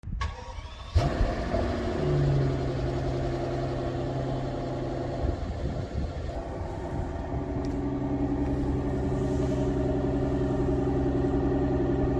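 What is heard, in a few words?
Maybach, exhaust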